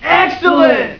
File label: Extra Life; Star Chaos v1.2